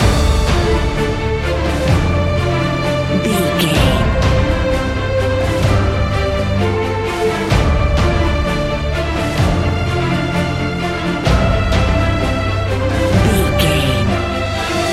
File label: epic cinematic loop